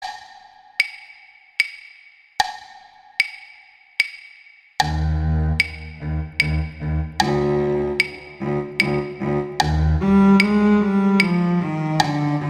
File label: Duet Cello I; Duets; Mozart